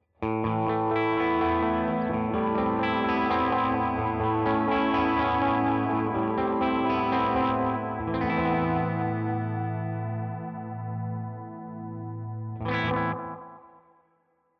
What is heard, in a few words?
Delay; Nocturnal